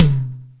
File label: DRM, YAMAHA R5+RX